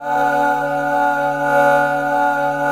AAHH C#; Rarefaction - Poke in The Ear With A Sharp Stick

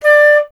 FLT FLTD, Flute; Miroslav Vitous - Solo Instruments VOL-2; Solo lnst. II

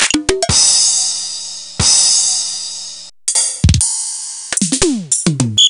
Wersi Prisma-DX5

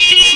alarm; Ueberschall; Techno Trance Essentials

